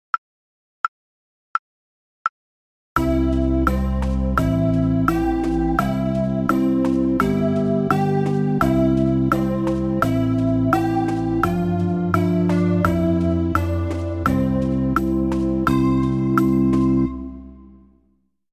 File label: melody medium tempo